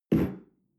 espresso place tablecloth slide short, restaurant cafe